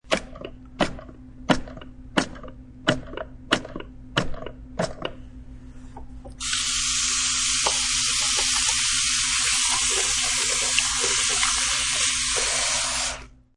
Soap Dispensor And Wash Hands, Using soap dispensor then washing hands; Public Bathrooms